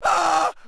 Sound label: pre fall, Rider